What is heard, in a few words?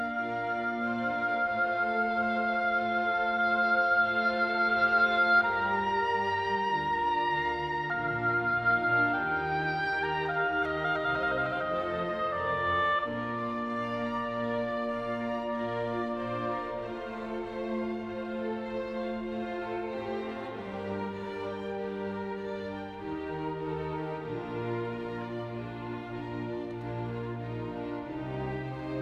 Concerto, English Chamber Orchestra; Concerto In D Minor II Adagio